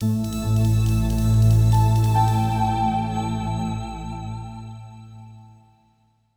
SPACE PAD 140 A; Keys; SpaceSounds

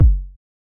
Kicks; One Shots; Touch Loops; CosmicFunk

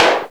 CLAP SMALL 2; KillerTweaksII; DirectWave

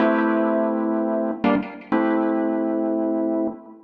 DEEPHOUSE ORGAN 125 A

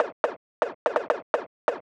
HOUSE PERCUSSION 125; Beats

